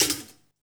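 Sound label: percussion, 160 BPM, drum & bass; Ueberschall; Drum & Bass Flash Trackz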